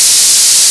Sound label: White No Lows; AcouSynthesis